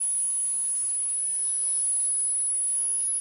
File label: dog whistle